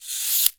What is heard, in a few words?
CABASA ROLL, BRAZIL